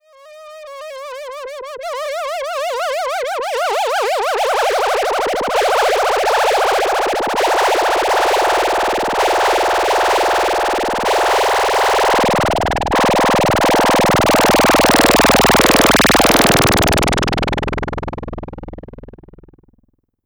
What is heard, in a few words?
Synth Sweep